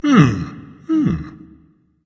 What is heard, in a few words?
agree berserker; cuchulainn